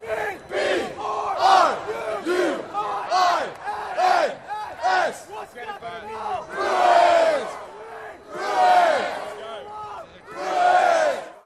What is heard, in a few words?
Bruin spellout; uclas basketball student section